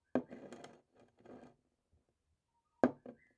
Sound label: Glass On Table